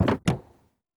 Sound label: Car Door Open